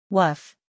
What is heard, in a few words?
woof